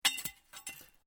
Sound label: METAL PIPE FALL; Sound Effects:Materials; Foundation3